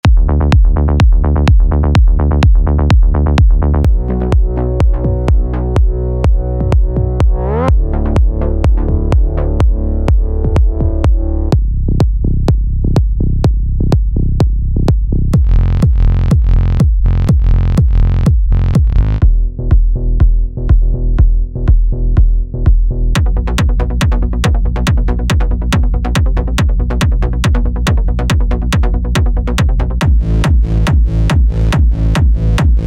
Kicks, live kicks; Production Music Live

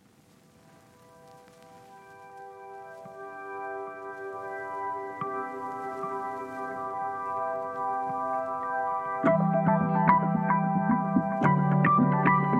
Electric Piano; sonuscore; roki; kontakt

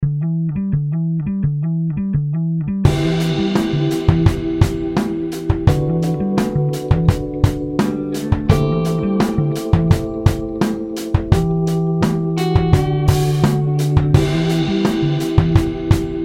Serge Drums; Mouse Orchestra

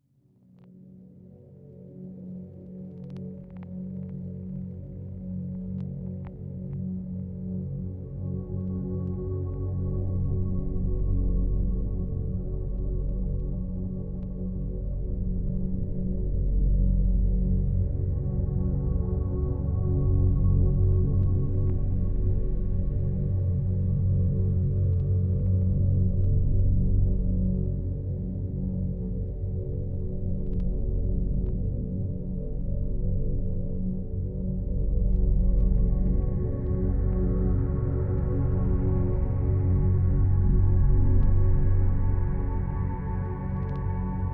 Ambient; Luftrum; ambient soundscapes for spectrasonics omnisphere 2

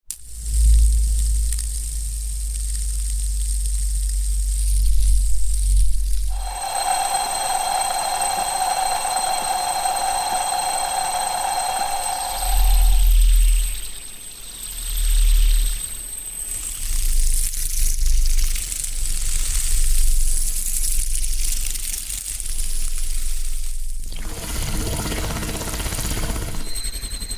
Hand Spinner Source And Pre Designed; Fox Audio Post Production

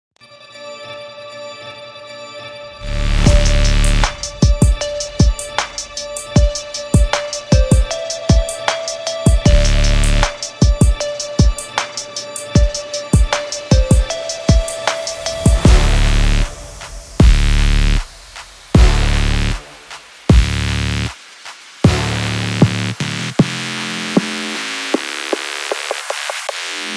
Dubstep Drums; vstbuzz; Jungle Flare

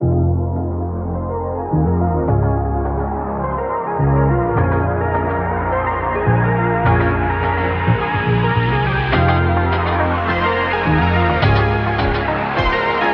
Synthwave; Slate Digital; MIDNIGHT

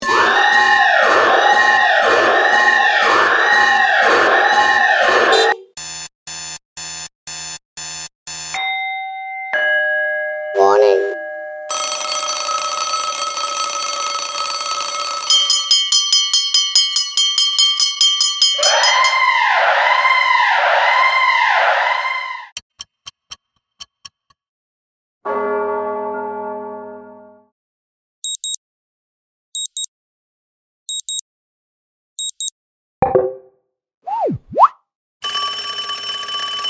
Clocks Alarms; Field and Foley

